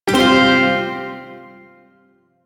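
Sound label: Shawzin Pentatonic Minor Chord; Warframe; Duviri Shawzin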